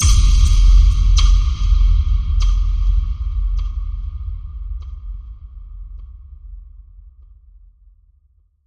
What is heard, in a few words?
Halfling Forest Boom